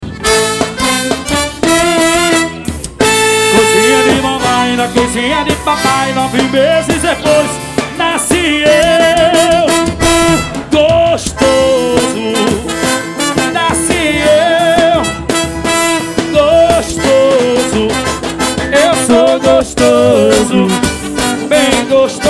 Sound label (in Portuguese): forro; manaus am